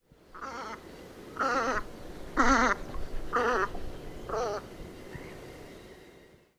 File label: velvet scoter melanitta fusca; putni liepajas ezera zirgu sala